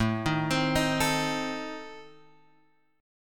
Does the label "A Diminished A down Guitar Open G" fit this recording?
Yes